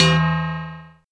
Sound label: Roland R-8 Bell, Drums